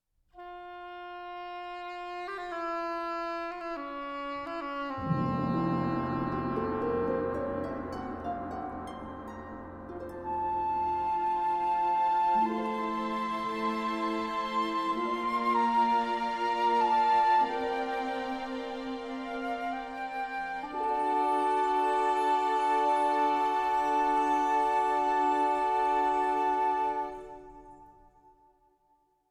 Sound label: Orchestra Choir Tracks Scene Change To Bethlehem